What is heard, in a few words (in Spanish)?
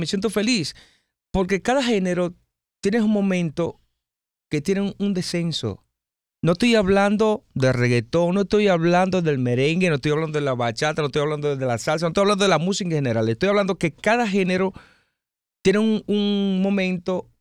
REGGAETON V BACHATA